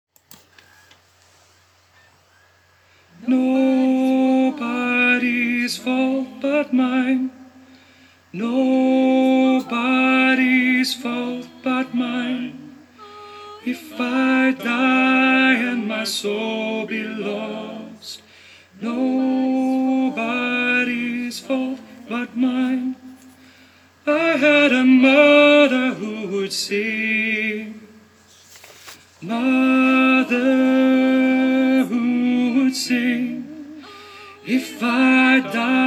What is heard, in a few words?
tenor